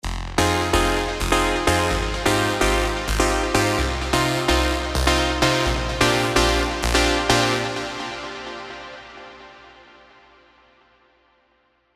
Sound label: Weird Tone, plucks; revealed spire ultimate plucks vol 1